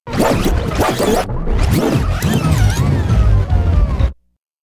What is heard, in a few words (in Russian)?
Zazhevalo kassetu